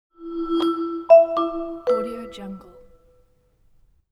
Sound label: bright simple logo